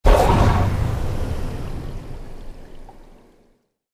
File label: Submarine torpedo launch